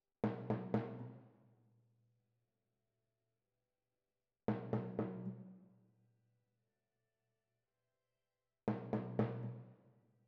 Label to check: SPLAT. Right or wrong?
Wrong